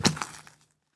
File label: step, mob zombie; ayuncraft-1.5